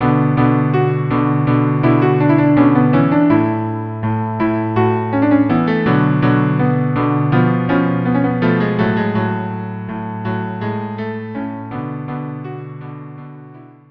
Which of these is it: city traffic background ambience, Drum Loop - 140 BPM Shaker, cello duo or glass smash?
cello duo